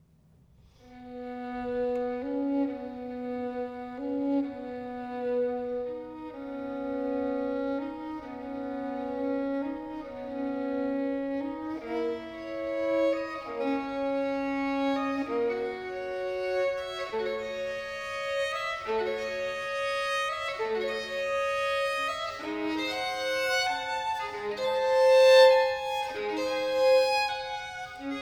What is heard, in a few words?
Violin Sonata in C Major Adagio; Violin Sonata No. 3; BWV 1005; Bach